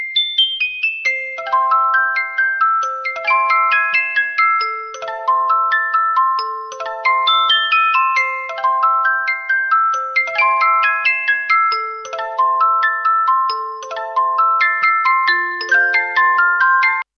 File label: Nokia E60